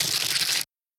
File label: zombie scratch; Zomboid 41.78.16; Project Zomboid